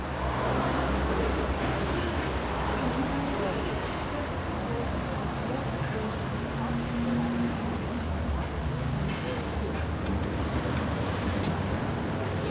atmo loop, street; Bordell der Begierde

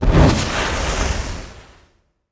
arrow; wiupgra

